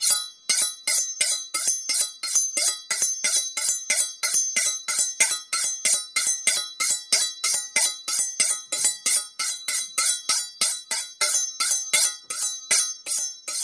sharpening a knife

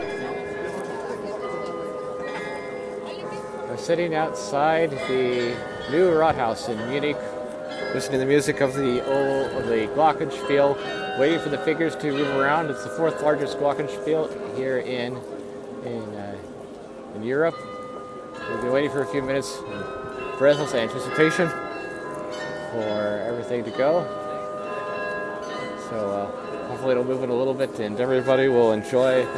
Munich glockenspiel

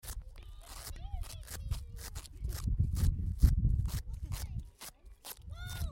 velcro

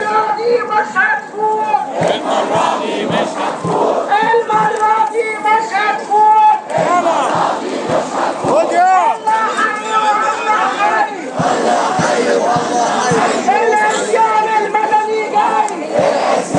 sounds of tahrir

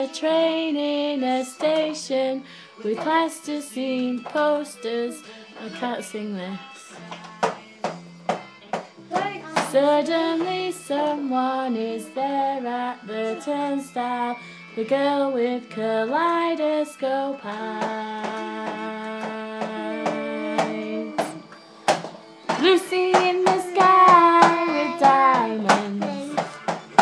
kids playing rock band

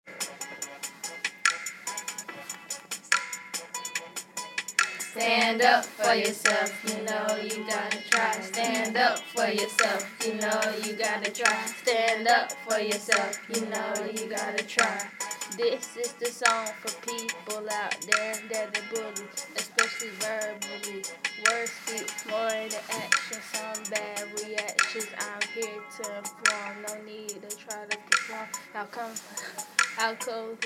group rap

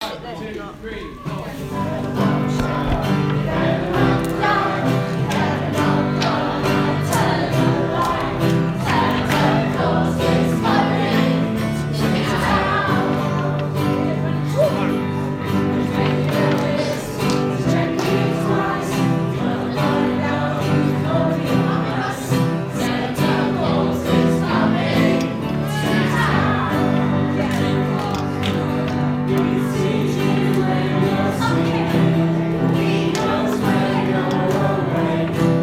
school choir; lansdowne

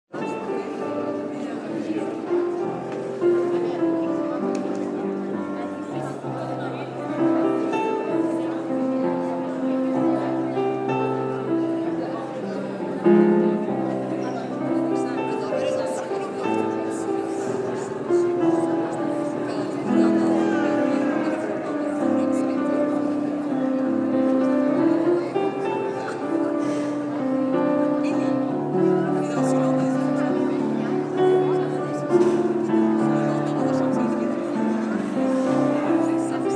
real live lounge music

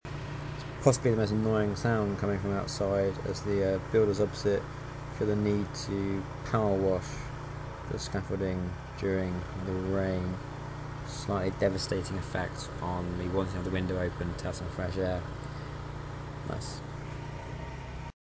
noise pollution

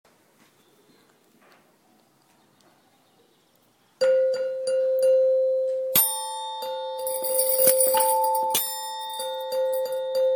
jolly melody

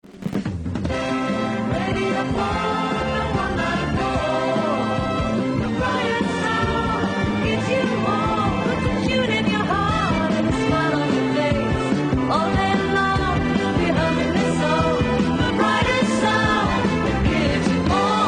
radio launch jingle; radio forth

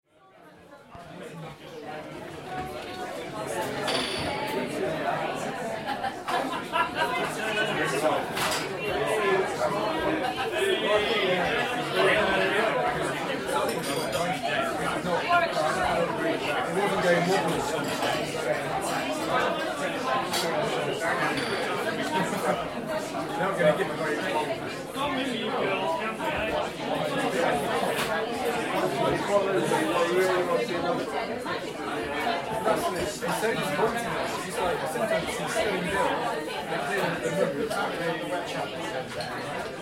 at the pub chatter and noises; Nag's Head

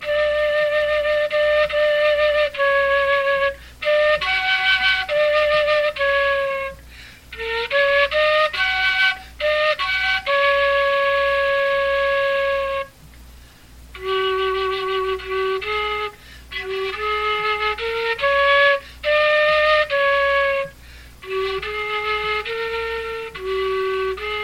lullaby bansuri

